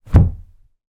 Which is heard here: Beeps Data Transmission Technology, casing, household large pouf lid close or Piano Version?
household large pouf lid close